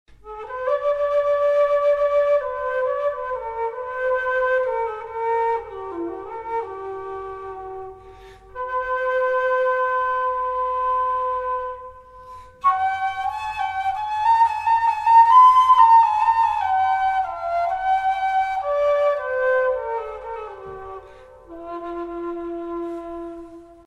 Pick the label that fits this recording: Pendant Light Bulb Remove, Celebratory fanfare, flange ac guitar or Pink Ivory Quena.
Pink Ivory Quena